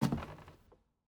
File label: Footstep Wood Stairs